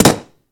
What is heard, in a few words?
Drop gun